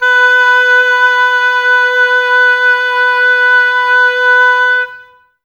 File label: OBOES B, Oboes, Wood Winds; Miroslav Vitous; Woodwind Brass Ensemble